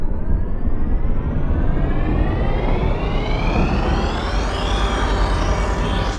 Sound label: plasma cannon power up; ships combat; Cosmic Rage